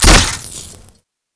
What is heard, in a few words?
zeus hit wall; czero